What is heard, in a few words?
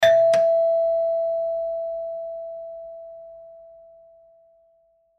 Doorbell Ring; Rings; Household; Electronics